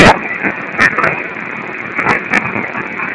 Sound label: HAHAHA